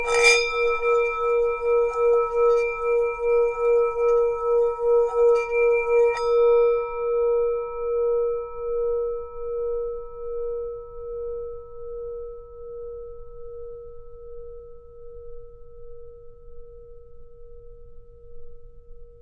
ringing tibetan bowl; Bells

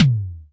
Pearl SC-40 Kicks

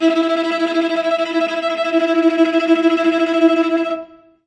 violin suspense; Orchestral

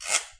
guiro; Clangy Crunchy Foundsound Kit

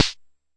Jomox MBrane, drum machines; Jomox MBrane 11

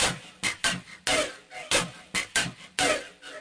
140 bpm, purified break, drum loops and breaks

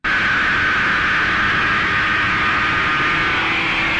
pure screamnoise, male; MALE MISC and SPOKEN WORD

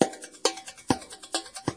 136 hat and thin drum, drum loops and breaks

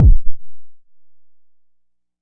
Jomox MBase, drum machine; Jomox MBase 01

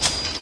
clicky glassbreak; Weird and Interesting Hits; single hits

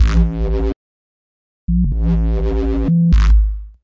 bhaji bass

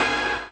HIT ELEC, Kawai R50; DRUM MACHINES

